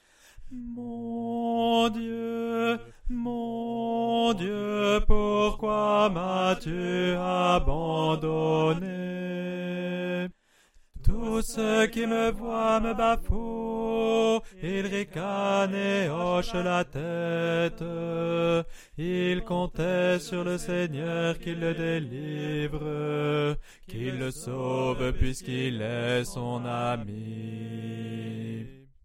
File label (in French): ensemble B; Basse